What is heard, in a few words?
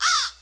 Crow